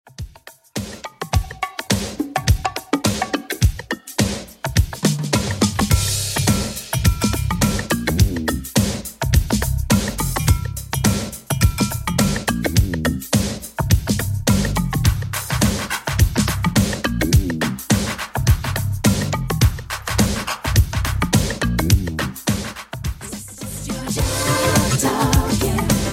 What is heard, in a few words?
80s Redrum, 105 bpm